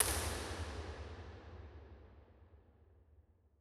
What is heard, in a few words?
Basilica Dome L, Impulse Responses; Bigger Spaces; Transfiguration; Ableton Live 12 Lite